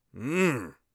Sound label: sceptical, warrior voice; Warrior Voice Pack